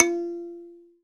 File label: KALIMBAS, F 4; SANSA; Heart of Africa